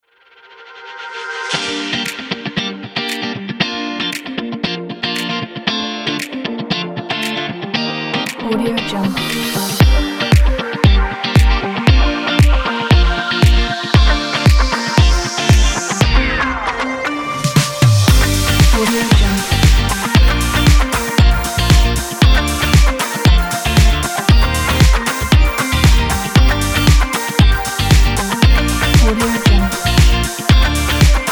happy

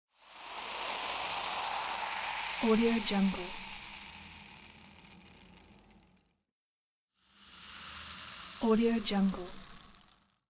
soda water fizz; sviridpavlov

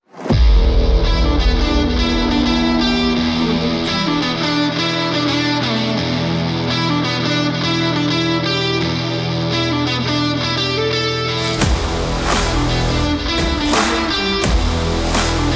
energetic dynamic action rock

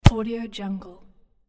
kicking soccer ball; javierpaniagua

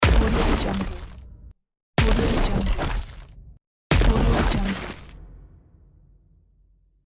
elemental bow earth attack

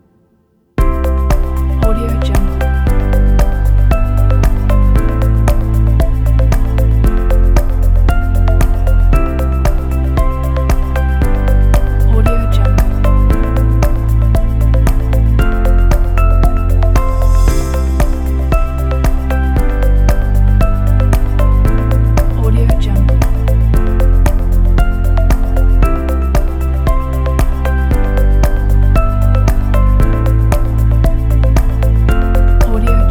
technology corporate

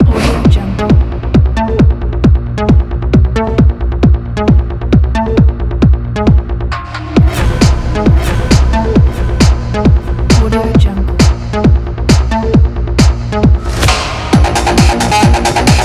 techno cyberpunk

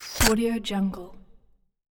injection

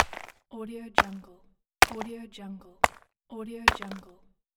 stone impact

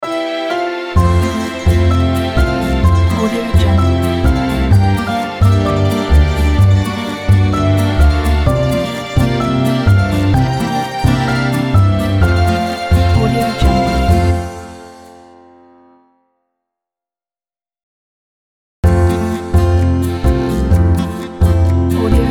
italy ident; gentlejammers

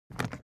foley briefcase plastic pick up hard; smartsoundfx

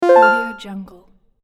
retro 90s video game confirmation menu sound

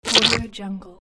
slime splat

